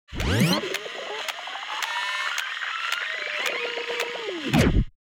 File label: error